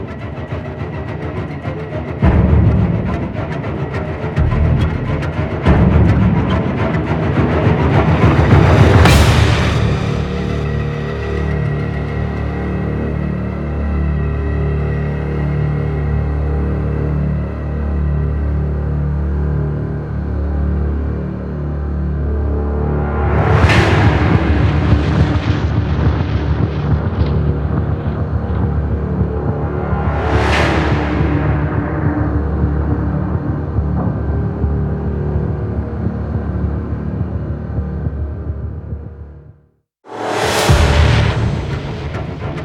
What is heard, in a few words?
Forest Night Intro Music; Warframe; Cinematics; The Sacrifice; Sacrifice Quest